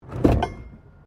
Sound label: PLASTIC BARRICADE METAL PIN MOVE; Material components for constructing more complex sounds